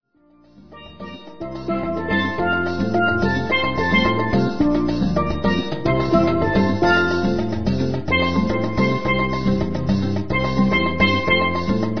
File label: Steel Drum Band